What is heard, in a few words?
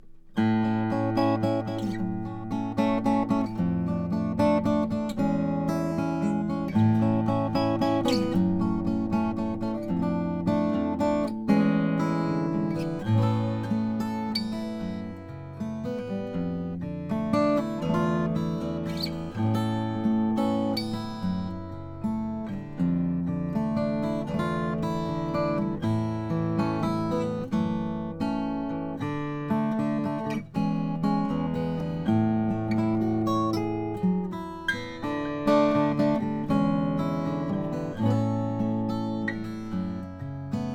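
GC cutaway mahogany acoustic guitar; hewett; plum pudding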